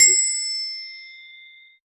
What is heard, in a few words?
35 ms noise burst